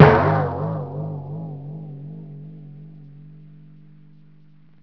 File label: CART DRUM; toon